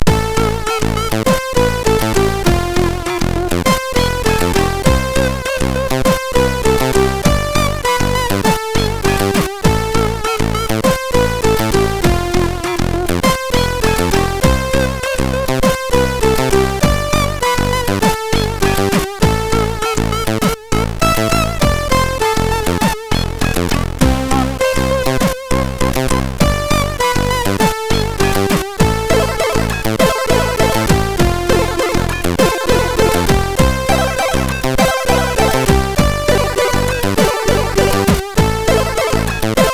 Jingle, sid MOS6581R4

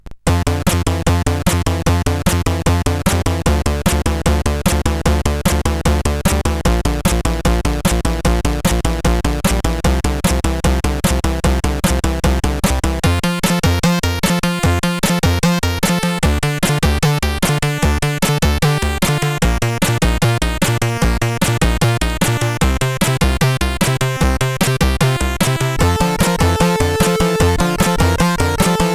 Remix, sid CSG8580R5; SigmaZeven

